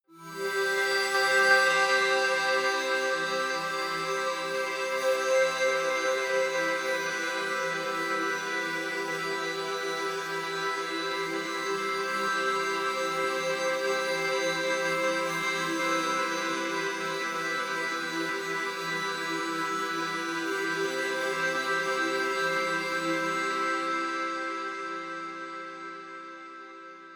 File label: hope sequence, pads; flaechen